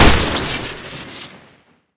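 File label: submachine gun shoot crit; smg; tf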